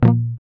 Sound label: GUI A#, Acoustic